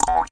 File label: jawharp E2 C; WORLD